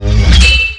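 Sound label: metal wings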